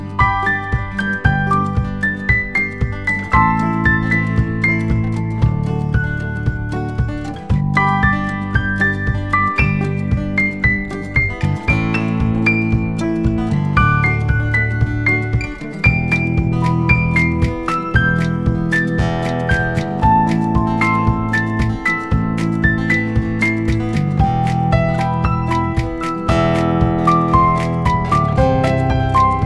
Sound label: Vivo Ringtone; Vivo Z5x (2020)